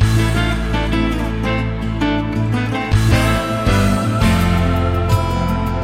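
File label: guitar greensleeves; Loops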